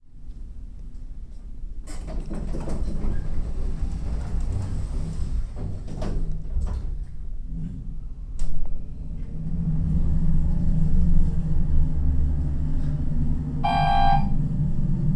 elevator door close

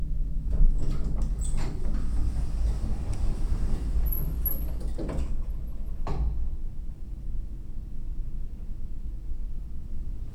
elevator end

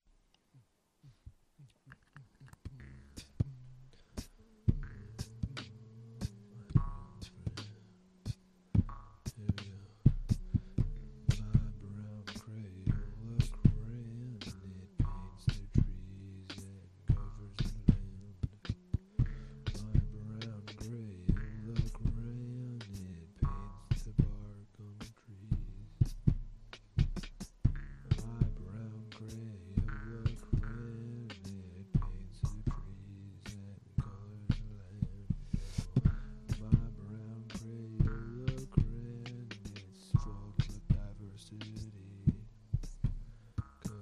brown crayola crayon

